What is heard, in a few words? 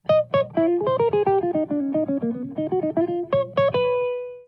Riff